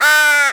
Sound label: squawk call, crow; critters